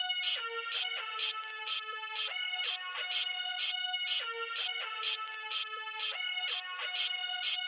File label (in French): nappe stride